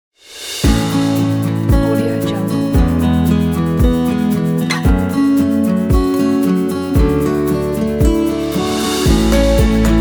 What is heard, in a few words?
acoustic guitar inspiring